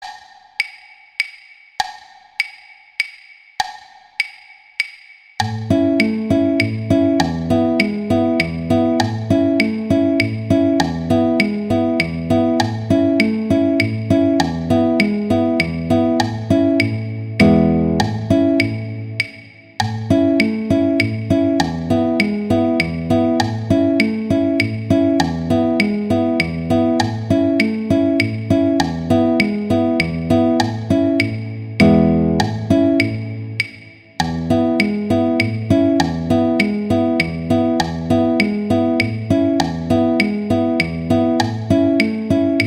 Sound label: Polonaise Andantino Violin; Paganini; Sonatas Op1 Vl Gu; Sonata No 5